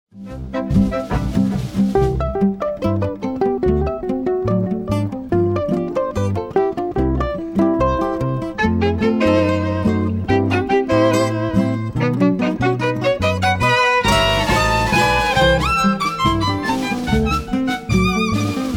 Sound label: Fat Tuesday Rag